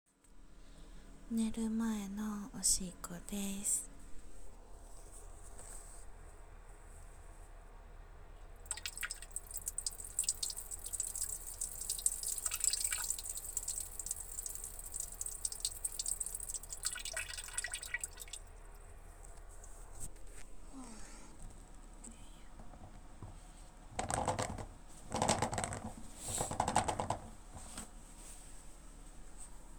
寝る前のトイレでオシッコ

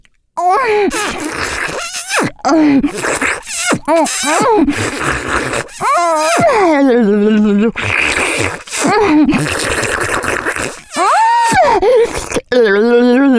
フェラ, ASMR; 手マンコとひょっとこバキュームフェラ; 音大き目; ギャルフェラッ!!学校は援交ギャル風俗店ッ!!

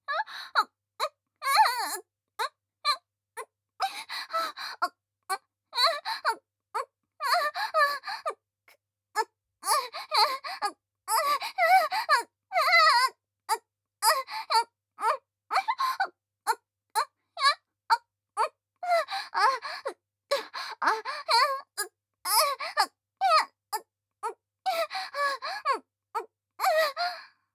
激しい喘ぎ声; ハナ

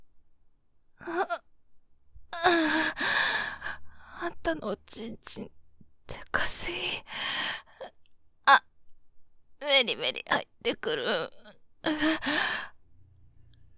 はぅんっ、あはん…っ

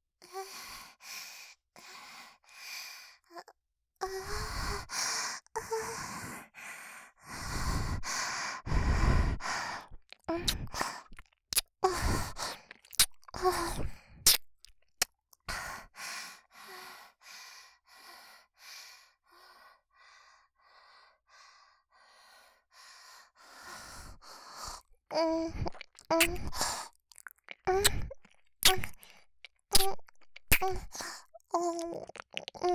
キス; マゾハーレム; フェリシア様

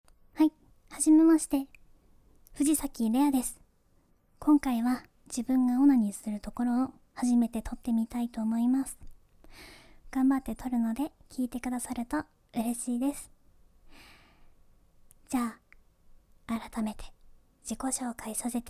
初 ガチ 実演 オナニー; THE FIRST ORGASM

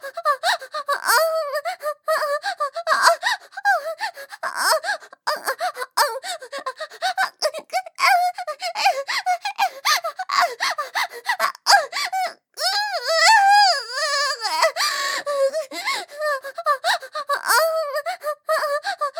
喘ぎ声; 喘ぎ声に屈服して自慰で果てろ

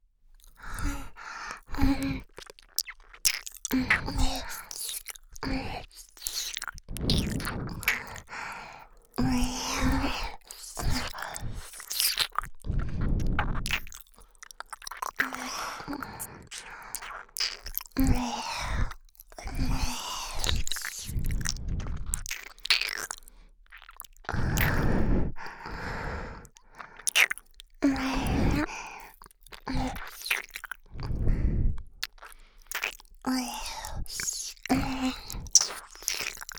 スキスキ耳舐め「分身」(両耳)

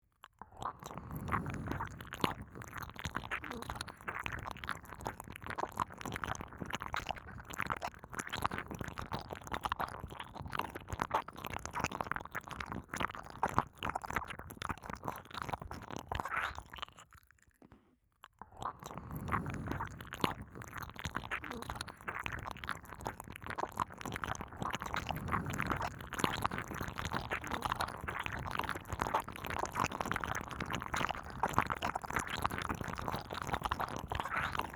左右同時耳舐め; スライム娘10人増殖超絶ハードMAX

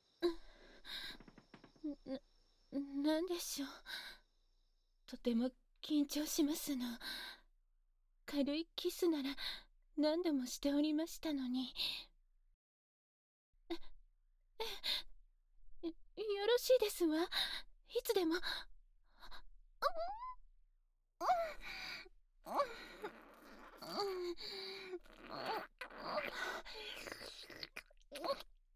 お嬢様のぎこちない長舌ディープキスと耳舐め手コキ; 蛇舌エルフの耳舐め子作り妊活性活

